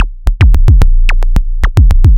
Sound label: 110 Bpm Fm Rhythm